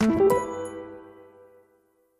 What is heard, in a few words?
wood chip minor 3